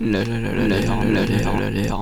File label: LALALAL